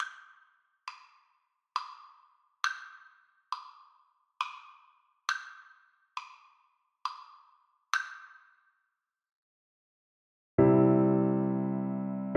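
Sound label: recorder karaoke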